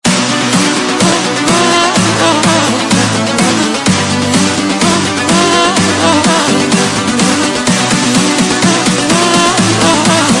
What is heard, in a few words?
WAREHOUSE FUTURE HOUSE SPIRE; MAINROOM